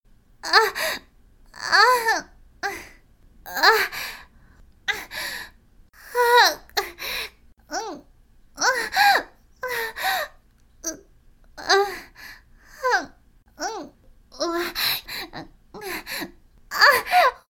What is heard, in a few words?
moan; oppaigames